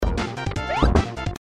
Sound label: jumping; e.v.o. search for eden